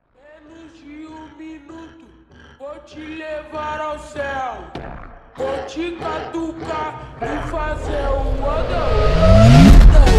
Remix

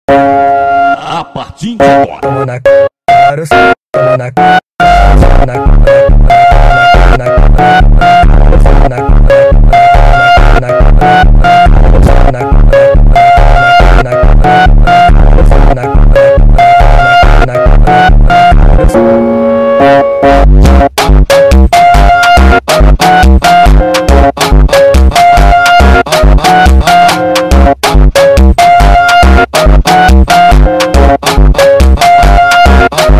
Phonk FUNK

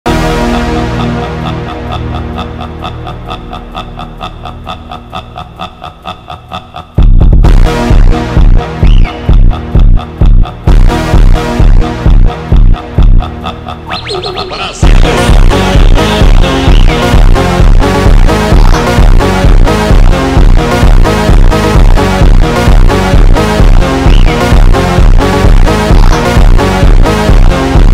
Phonk

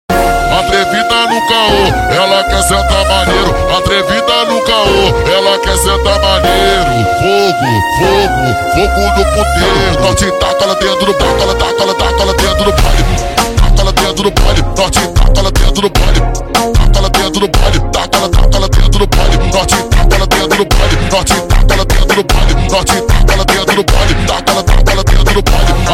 Phonk Super Slowed

